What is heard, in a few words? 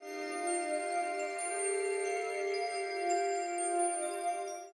Ui Hero Selector; portal quest; android